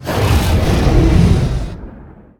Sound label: turn, dragon; combat; creatures